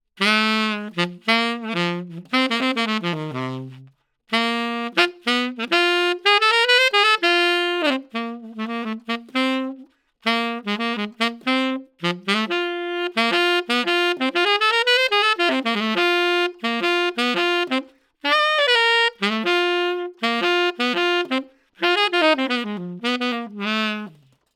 SAX TUBE

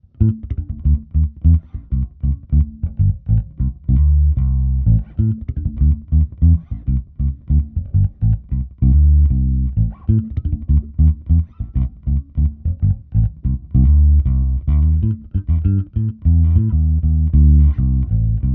EL BASS

